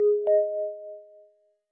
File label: contacts online